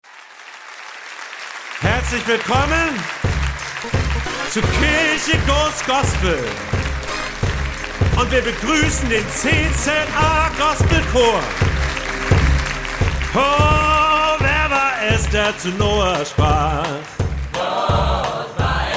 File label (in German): hoerprobe musik gospel